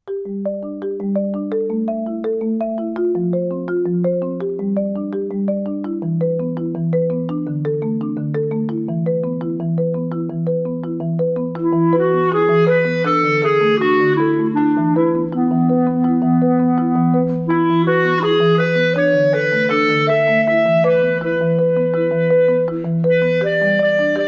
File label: Klarimba